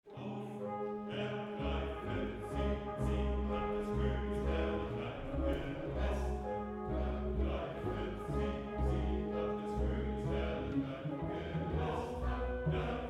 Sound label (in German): Chor und Solo Leibwaechter; Salomo